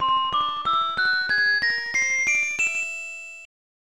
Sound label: Unused Jingle; bs bdash; snes